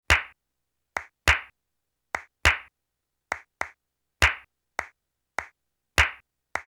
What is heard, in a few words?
siguiriyas, flamenco guitar